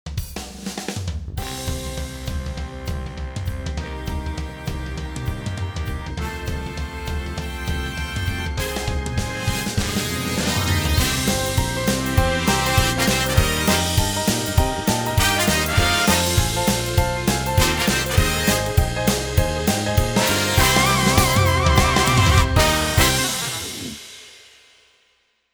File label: Jingle fade in